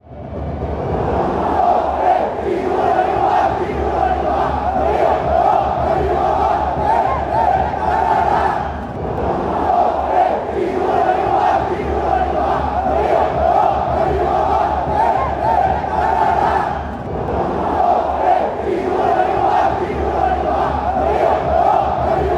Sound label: heyy uno dos tres fan chants